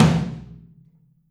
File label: Drums Percussion